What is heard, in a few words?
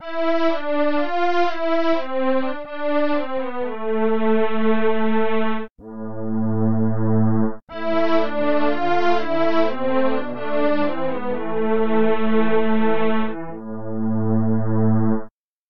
brightness